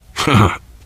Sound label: laughter, biker; source engine; left 4 dead 2